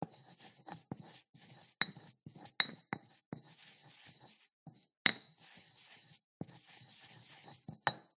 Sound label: household kitchen herbs pestle and mortar scrape